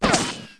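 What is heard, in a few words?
ricochet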